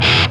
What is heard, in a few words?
GTR; Roland - LA Composer vol. 2